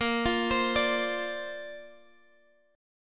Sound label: Bb Major Add 9th, Guitar, Drop D; 20,20,22,19,x,x